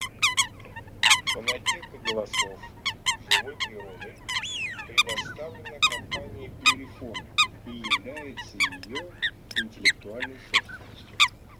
Лысуха Folaga

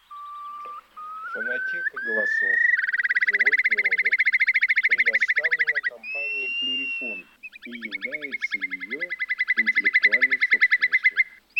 Кроншнеп Chiurlo; plurifon

